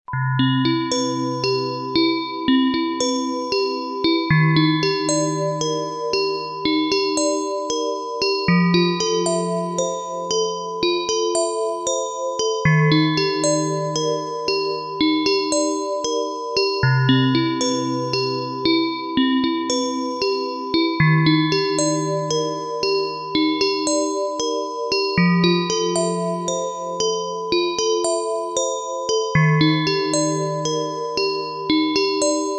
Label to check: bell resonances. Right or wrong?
Wrong